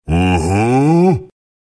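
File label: sounds of giant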